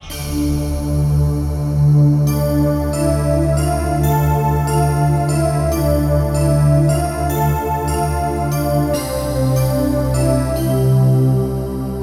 angel pad

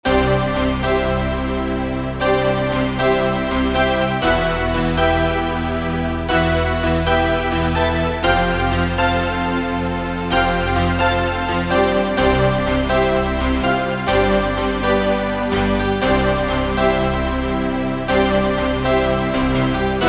glitter pad; CMS Weihnachtskalender 2024

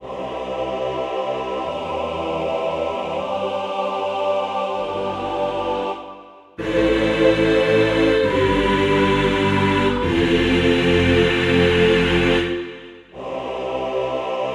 santa choir

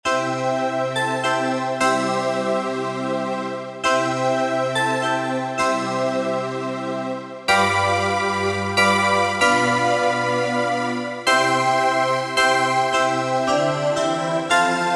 silent pad; CMS Weihnachtskalender 2024

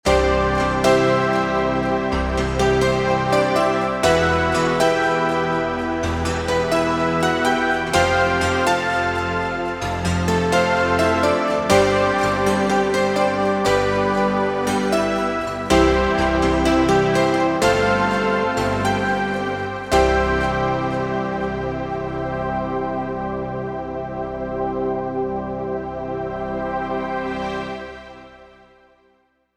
schlager pad; CMS Weihnachtskalender 2024

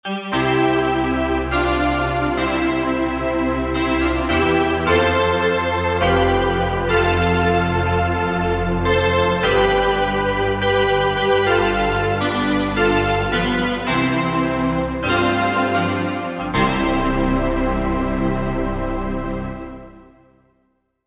bell pad; CMS Weihnachtskalender 2024

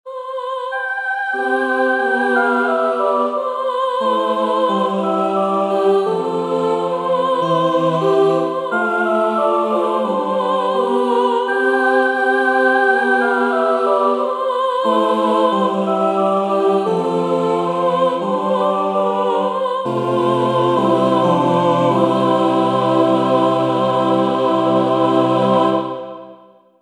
choir; CMS Weihnachtskalender 2024

